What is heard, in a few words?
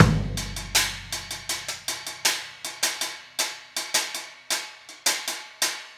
Cymbal and Hat and Kick; Combo